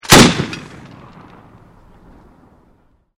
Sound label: MK19 single fire, MK19 Grenade Launcher Single Fire; Grenades; Explosions; VRSonic